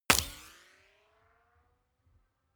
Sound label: bullet small impact ricochet; krieg-waffen; geschoss